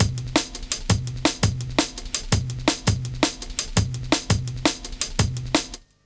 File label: LOOP, Jungle; Explosive Jungle; USB Soundscan vol.05; AKAI